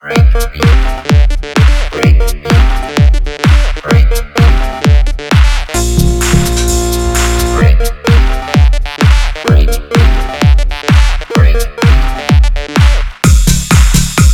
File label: Break Beat